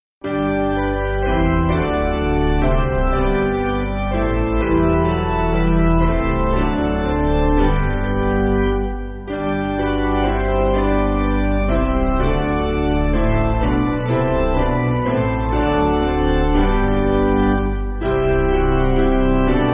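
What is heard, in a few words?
Piano, Music Backing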